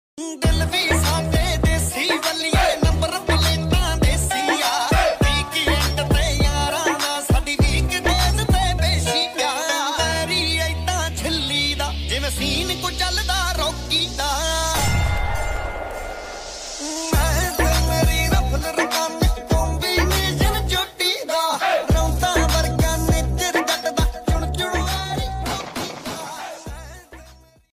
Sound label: Dance Punjabi Ringtone